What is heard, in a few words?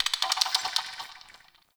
Ext Mid Bird; Ambience